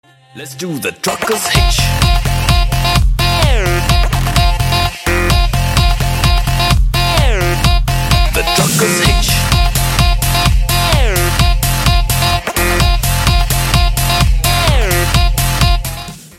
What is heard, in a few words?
Dubstep